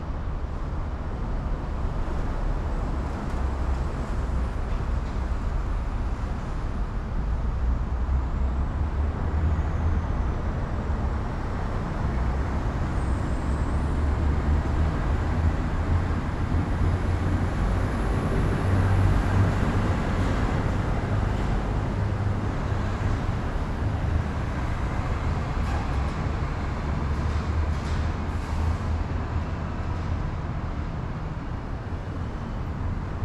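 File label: Urban traffic moderate busy train truck horns; urban and suburban; boom library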